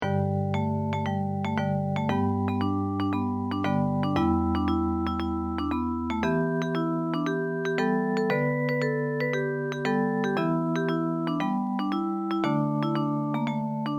japanese folk song; konpira fune fune

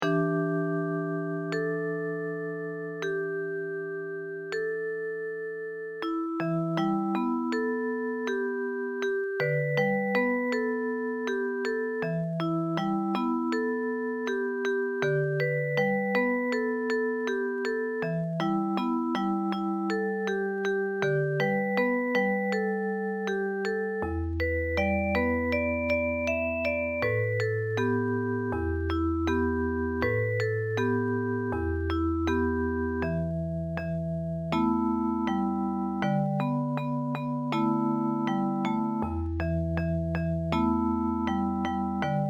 japanese folk song; akai setta

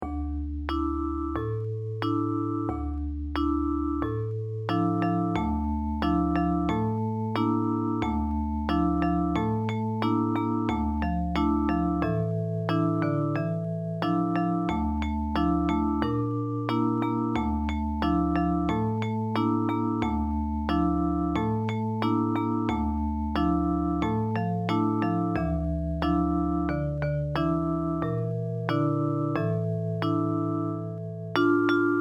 japanese folk song; kiyomizu no kannon sama

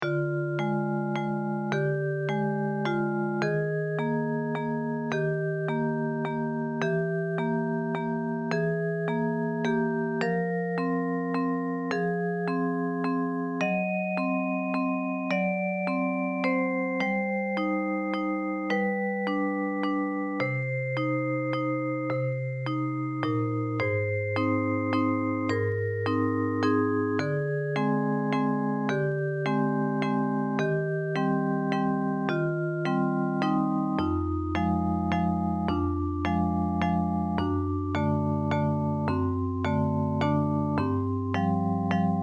simple waltz

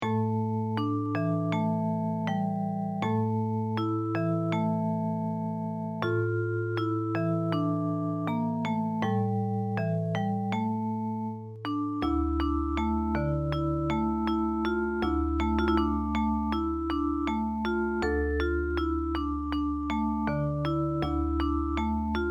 japanese folk song; uwajima sansa

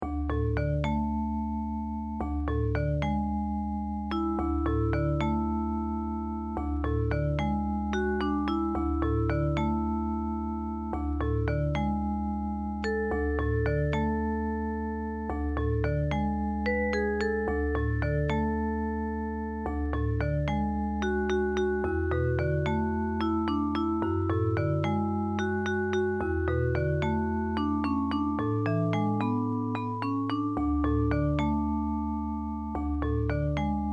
short melodies lydian melody

